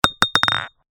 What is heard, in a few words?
notice bounce